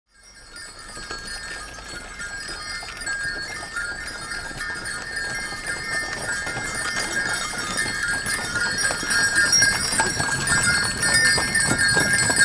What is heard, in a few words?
By Horse Drawn Sleigh